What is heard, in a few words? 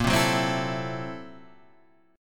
Fsharp 7th Sharp 9th Flat 5th, guitar chord